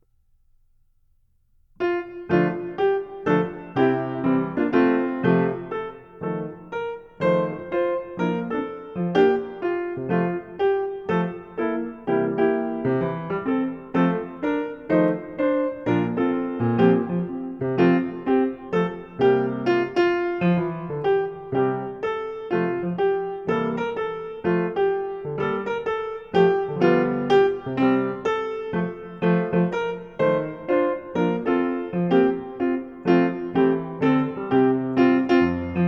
regg 120bpm, ballet class music

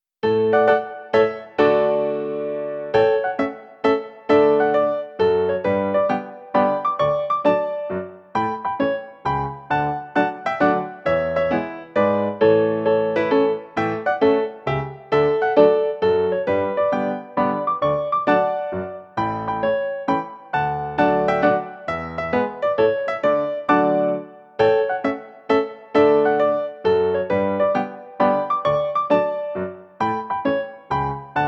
Mazurka; Delibes

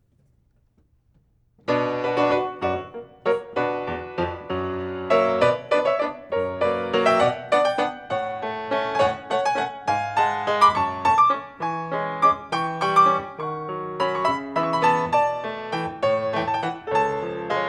waltz Bm 68bpm bright; Brahms

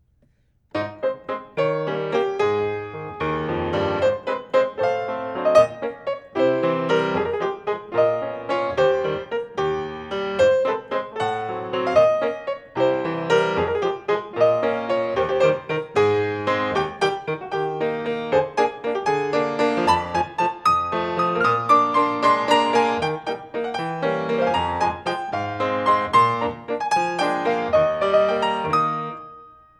Ab waltz 76bpm fast

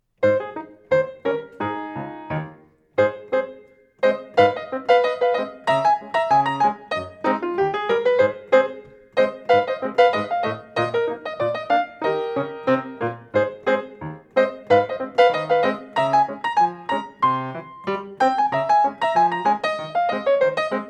Rag 90bpm; Pineapple Rag

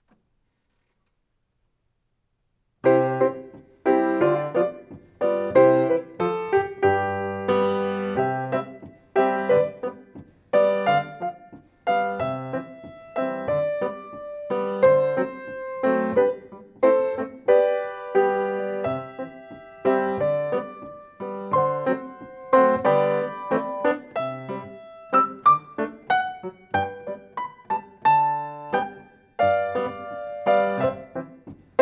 coda 90bpm; Czerny

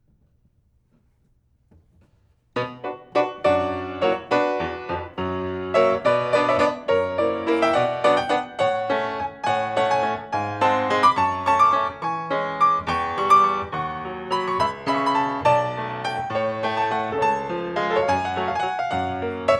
waltz Bm 72bpm brighter; Brahms

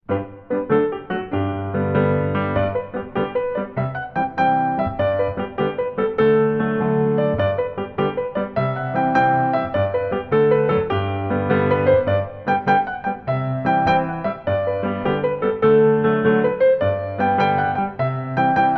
jig gigue

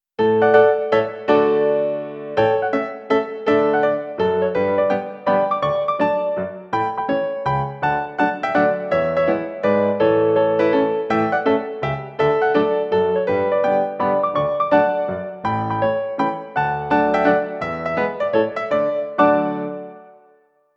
Mazurka; Delibes

